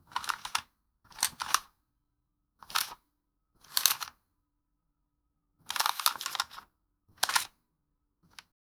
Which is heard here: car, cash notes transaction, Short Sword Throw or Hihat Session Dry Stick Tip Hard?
cash notes transaction